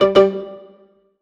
Jingle Illegal Move; clubhouse games 51 worldwide classics 2020 switch gamerip

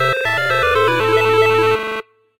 Fail; PinOut

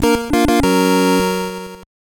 intro fanfare; Robin Hood: Prince of Thieves; NES

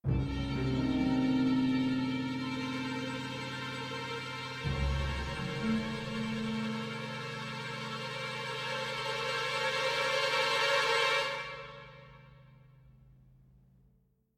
Stinger Suspense Short Intense, game soundtrack; Pillars of Eternity

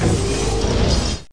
spawn loader Bot

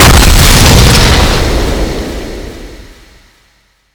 Boss Split; Pac-Man Championship Edition 2 Plus

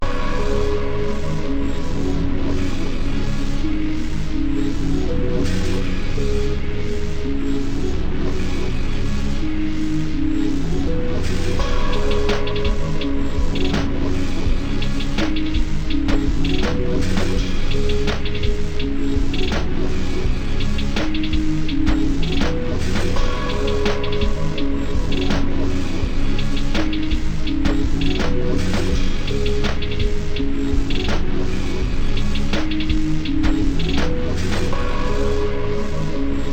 Dialogue

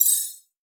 Destroy Powerup Shimmer; Boomerang Fu

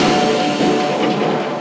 Desert Percussion; G.I. Joe The Rise of Cobra; NDS

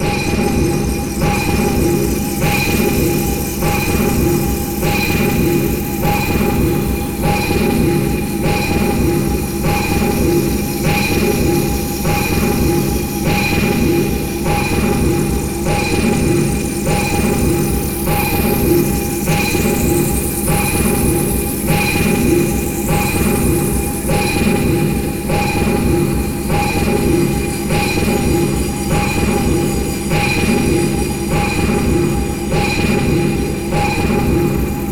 Combat Loop

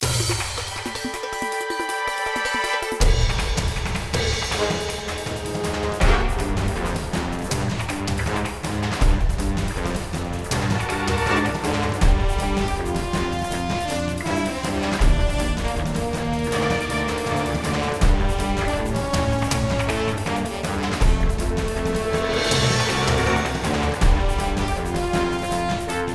Base Battle

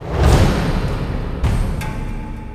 combat; Backstab; Android iOS gamerip 2011

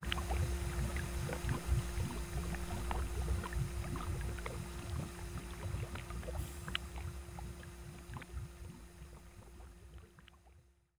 Ambient Substances; Afterzoom; DSiWare; NDS

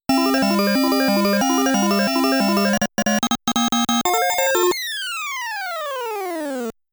Challenging Stage (Perfect); galaga

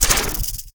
Monster Snake type Attack

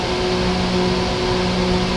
Gate Working; guns n zombies 2014 pc

